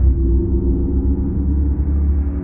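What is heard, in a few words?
Ambience Sci-fi Energy Loop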